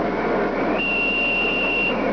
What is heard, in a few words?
cab signal